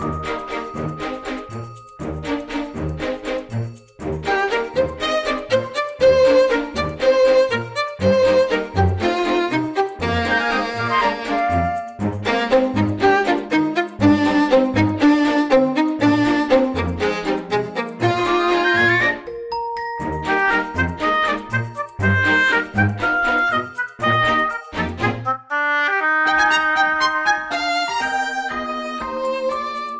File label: BGM Theme Loop